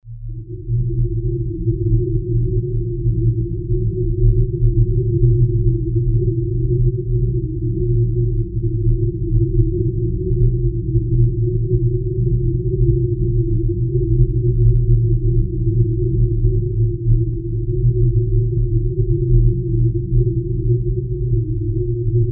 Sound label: scifi atmo